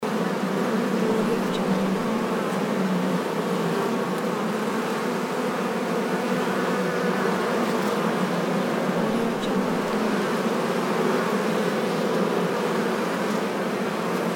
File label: bee hive loop